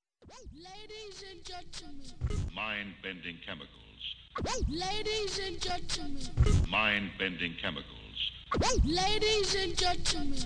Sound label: jingle